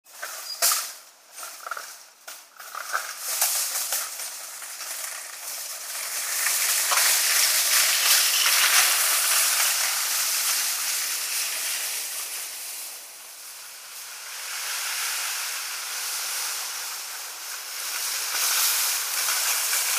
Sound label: trolleys